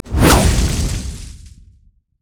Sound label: javelin toss; nidalee base